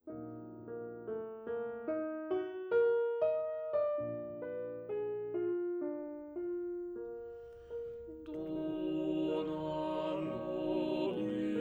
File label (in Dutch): Sopraan, Agnus Dei; Rossini; oefen CD; Onversterkt